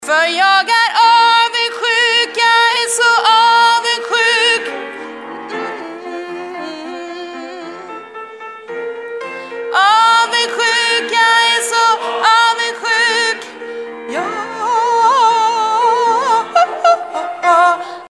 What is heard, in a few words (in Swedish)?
bas